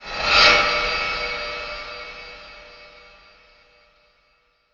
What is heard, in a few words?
VFX Atmos